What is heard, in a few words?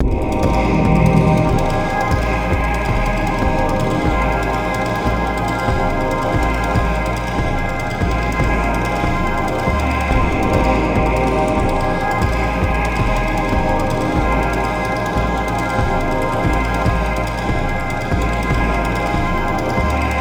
battle